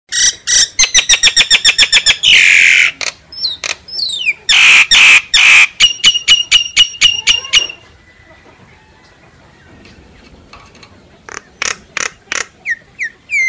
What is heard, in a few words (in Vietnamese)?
tieng sao hot goi bay